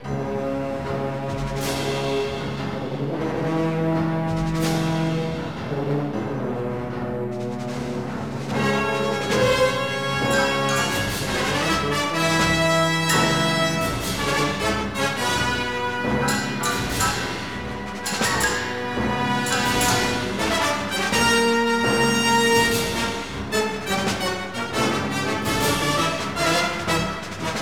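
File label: Music battle loop; Star Wars Episode III Revenge of the Sith; Greviousbattle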